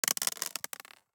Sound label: DICE on Plastic, Throw and Roll, Mini, 3 Three Dice; Samples; ArticulatedSounds; DICE Sfx Party (Extended)